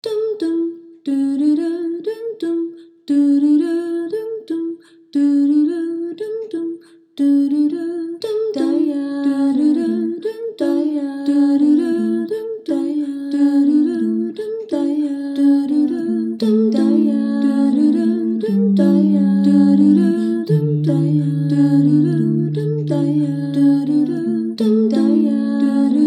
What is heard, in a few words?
lotus voices